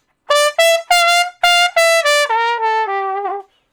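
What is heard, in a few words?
Trumpet Slow Blues (G I) pickup 1 beat; Crimson Blue and Fabulous Horncraft 4 RnB; Horncraft for R&B; Solos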